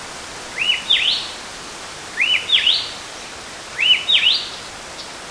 Hawaii Elepaio

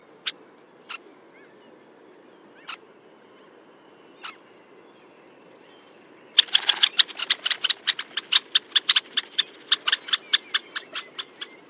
Red necked Phalarope Phalaropus lobatus

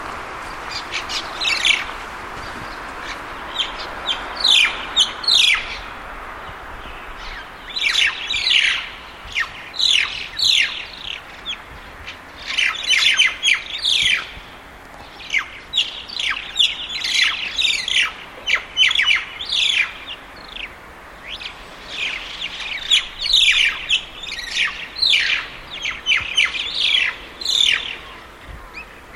Crested Myna

